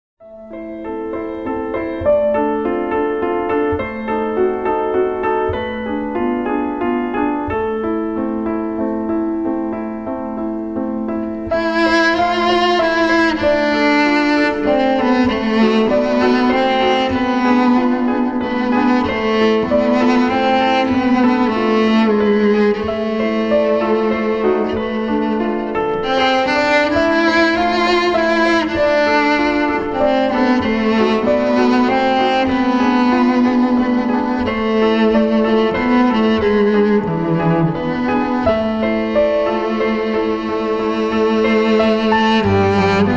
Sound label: Background Music; Club 33